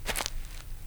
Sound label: step grass; Singles Flirt Up Your Life